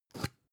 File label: spice jar big scratch open; industrie wirtschaft; gastronomie